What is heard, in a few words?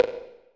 Percussion, House Drum One-Shots; GhostHack; Advent Calendar; House Kit